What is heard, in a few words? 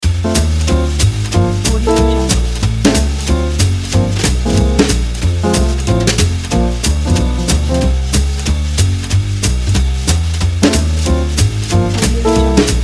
the jazz